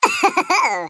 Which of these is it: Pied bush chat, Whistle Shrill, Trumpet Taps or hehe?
hehe